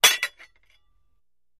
Axe Clinks, Dull; Single Medium Clink With Metal Scrape. - Metal Scrape